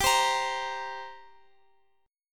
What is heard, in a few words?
G Minor Double Flat 5th, G, Guitar, Open F; Gmbb5; strummed; x,x,x,14,15,17